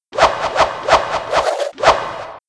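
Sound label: Mob attack; Monster; SubBossmob; 욱일승천류; 겐지; 2단계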